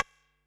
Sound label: HH, Pearl SC-40 Hihats and cymbals